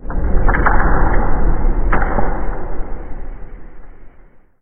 strange noise, underground

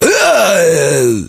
zombie take damage; monsters

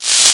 electra hit, anomaly

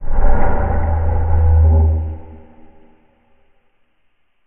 noise, ambient

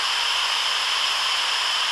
gas running; ambient; zaton

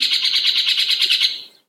bird, ambient outdoor

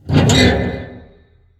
target up; ambient

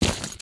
earth, human step

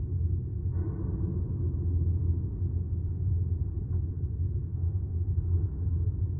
wind mine, ambient

